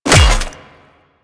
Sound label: Melee Hammer Impact Flesh